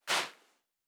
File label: Footstep Sand Walking; Character Sounds